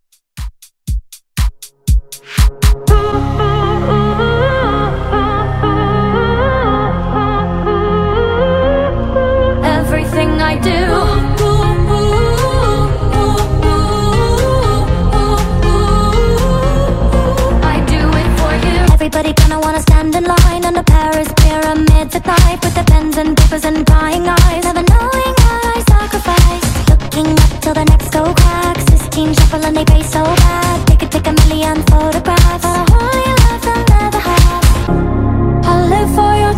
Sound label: Dance; Redrum